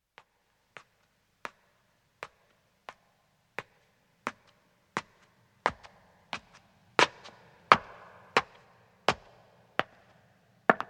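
Footstep Stone Ascending, 1 woman approaches and stops; BBC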